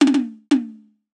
87, Toms Fill

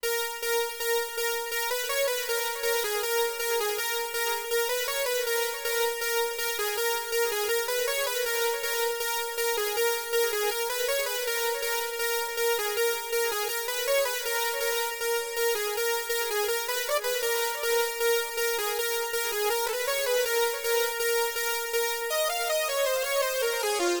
LEAD

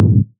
PERC FX

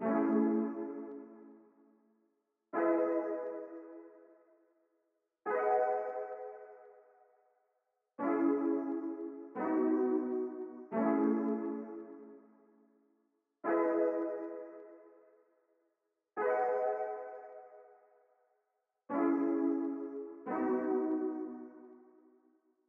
KEYS C m BPM 88

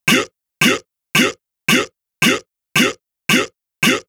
Vox 112 F-m Dry

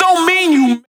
vocal shot f-

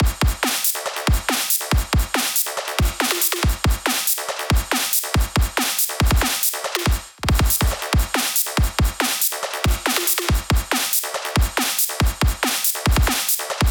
140 full drum loop; breakmaster

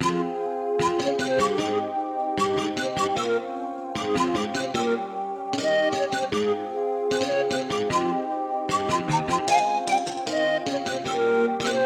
Melody Loop F min 152bpm

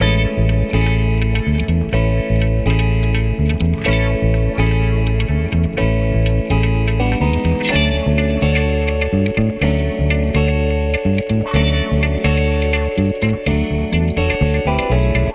125 A Music

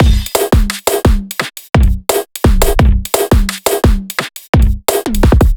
Drum, 172, full; Iron

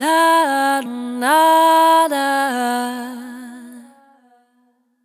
E Ladoda Vocal

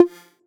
Bongo

